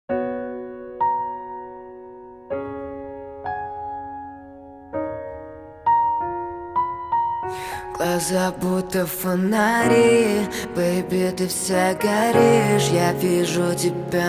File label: Acoustic version